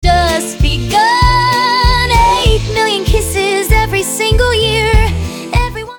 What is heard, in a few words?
sting; the goldenrod